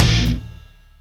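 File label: Guitar Hit; misc; Sonic Foundry (Sony Creative Software); Ugly Remnants VOL-2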